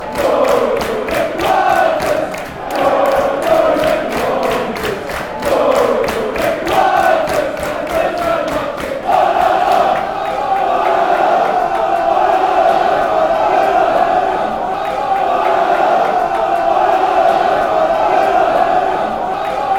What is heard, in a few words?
glory glory wycombe wanderers